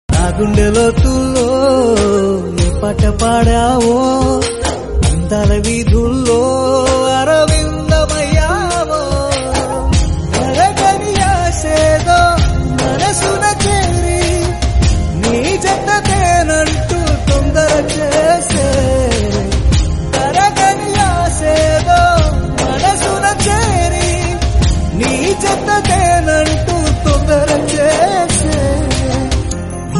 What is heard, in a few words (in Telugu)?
telugu private love ringtones